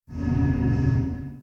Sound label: arcane effect; abilities